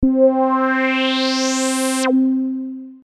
Env VCF after

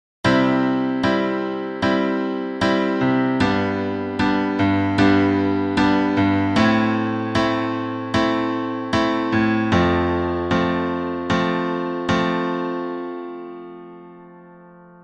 triad maj min

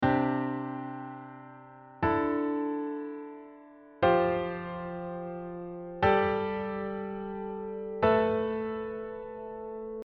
diatonic sus2 4

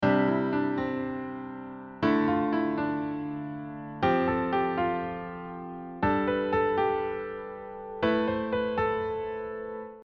sus2 sus4

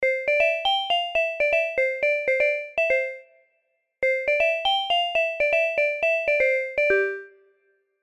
Env mallet

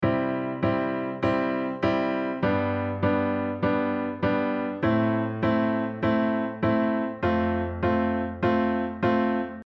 shuffle

